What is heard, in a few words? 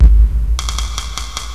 DRUM KLAC; Groovy Bytes: Behind the Moon; BAS PERC